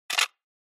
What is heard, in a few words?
Camera Film